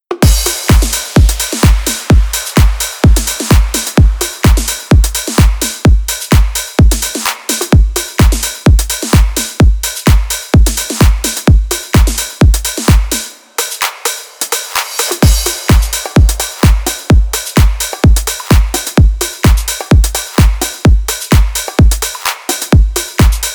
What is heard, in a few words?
house beat